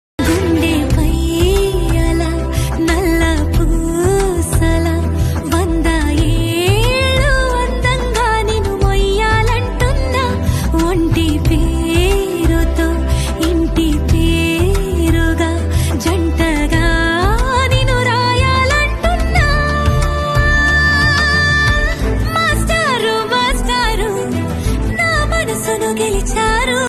telugu ringtone

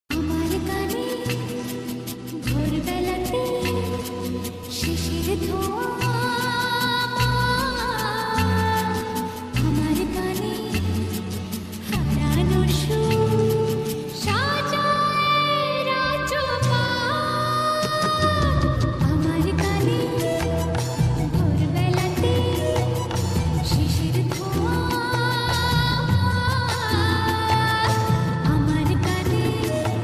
bengali ringtone

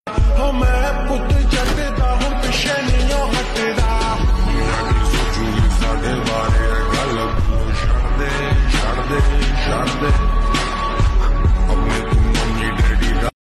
slow reverb ringtone

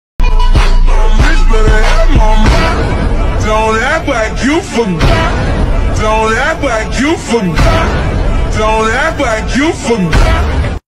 BGM ringtone

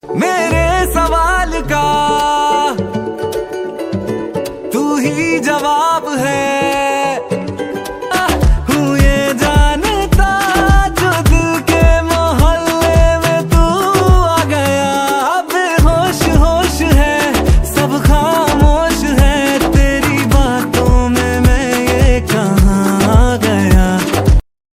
hindi ringtone

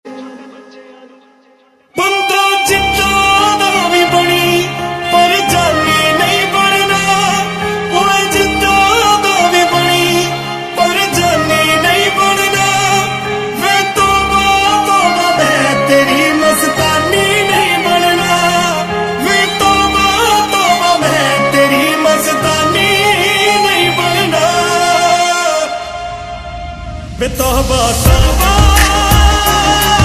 punjabi ringtone

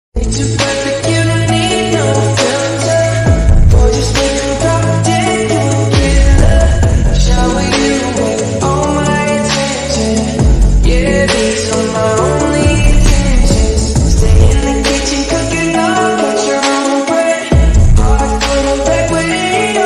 slow reverb ringtone

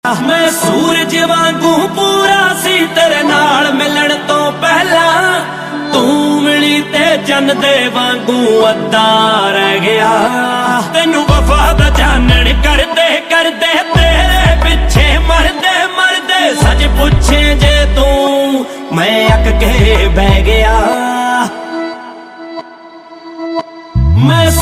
punjabi ringtone

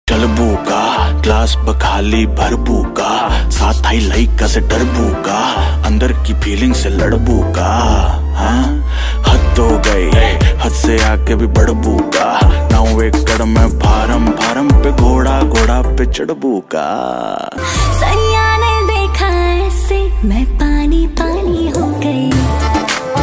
bhojpuri ringtone

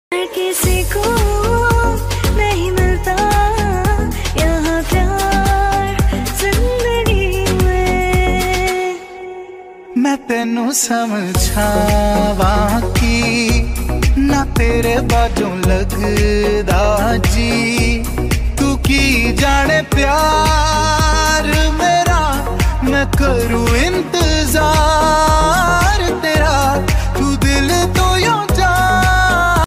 Hindi mashup remixed ringtone

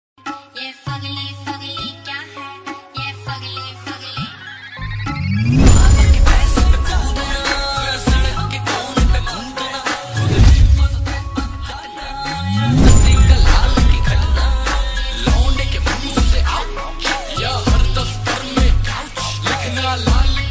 slow reverb hindi ringtone

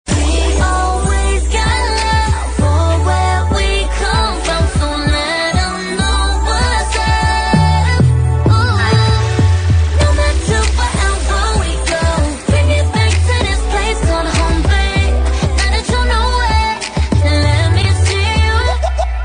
korean ringtone

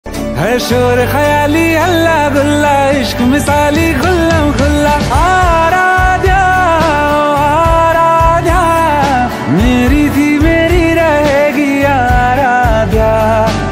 hindi ringtone

